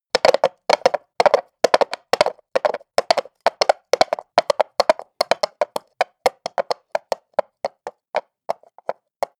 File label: horse hooves clack trot asphalt slowdown; natur-tiere; pferde